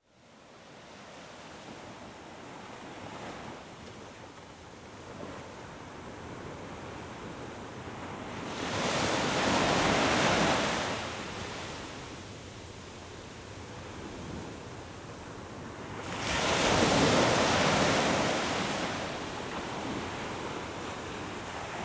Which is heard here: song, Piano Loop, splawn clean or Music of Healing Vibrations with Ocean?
Music of Healing Vibrations with Ocean